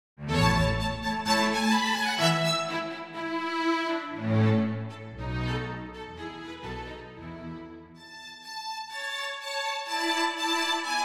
18th CENTURY STRINGS

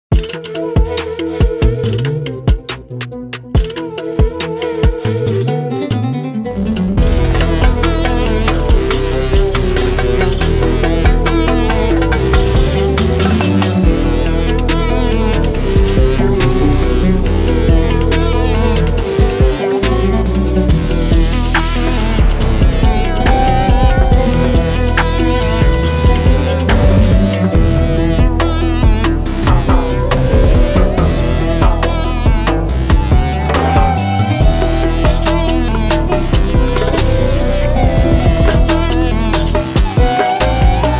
Percussion Of Anatolia; KontaktPlayer

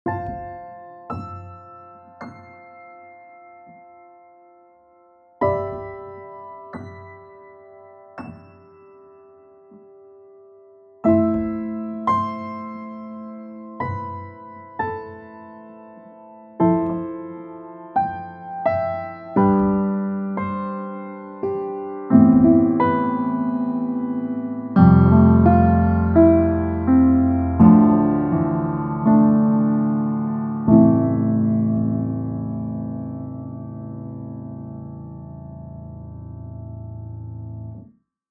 natural

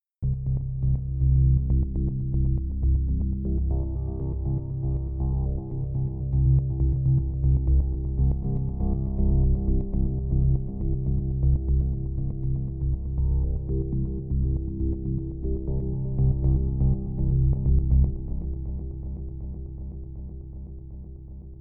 Dreaming Rhythmic

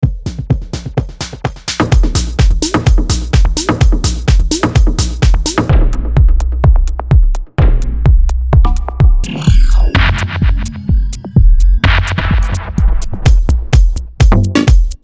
Drum Medley; Pulswerk

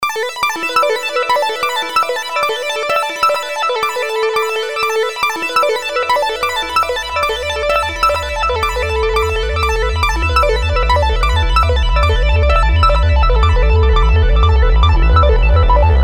Fanfare; Soniccouture

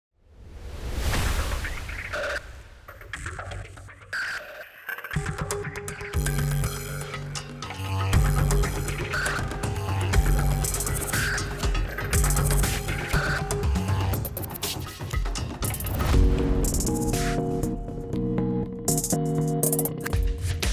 Groove

